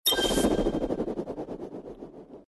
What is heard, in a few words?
Roll; corgi wild